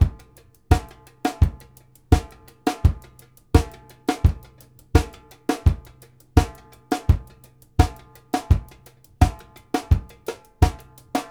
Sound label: 4 ON D FLOOR, 84; Sampleheads; New York City Drumworks VOL-1; SP BLASTX 84